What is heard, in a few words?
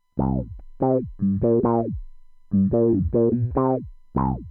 wah; enveloppe